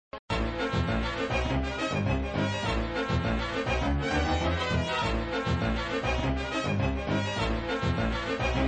Music looping; Well of Souls music (looping)

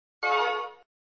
Sound SFX, flipper

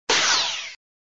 Sound SFX, flipper; sttng

